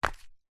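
foley sandal flip flop single hard footstep on hard ground; zapsplat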